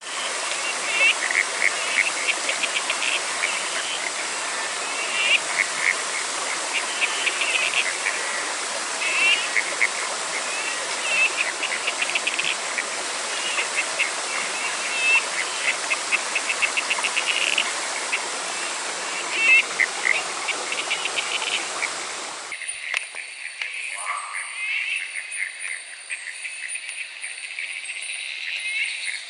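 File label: litoria pearsoniana, frogs; Cascade Treefrog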